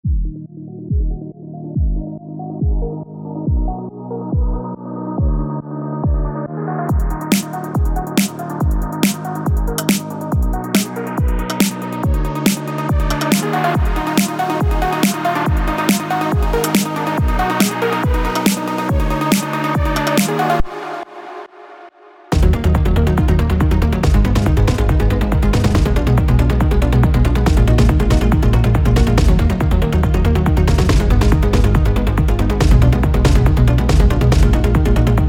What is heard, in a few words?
Neon Beats